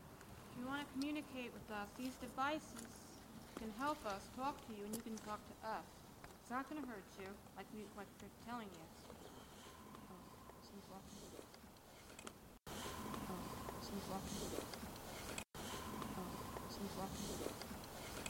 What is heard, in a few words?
walking